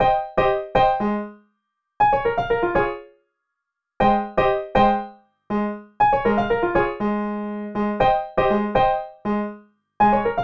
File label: music sunny